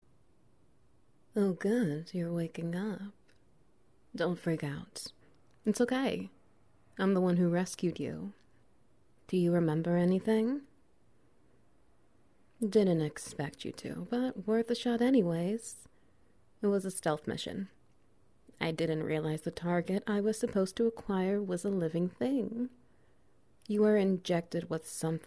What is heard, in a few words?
Wet Noises